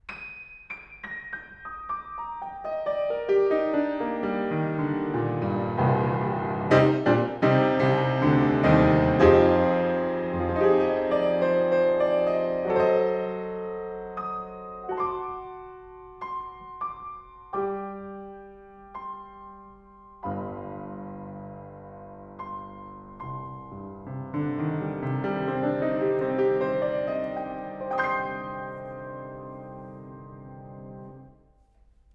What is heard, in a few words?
Piano, Horiz Open Mic